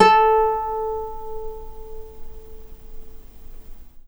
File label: NYLON GTR